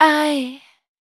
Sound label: VOC F, Dance Vocals, FEMALE; Best Service ProSamples vol.12; AKAI